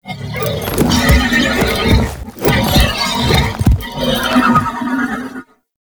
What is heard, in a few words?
Horse Idle Rodeo; Warframe; Duviri Horse; Vehicles